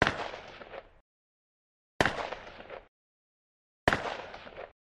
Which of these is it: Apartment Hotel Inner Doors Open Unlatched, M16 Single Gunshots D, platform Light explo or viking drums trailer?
M16 Single Gunshots D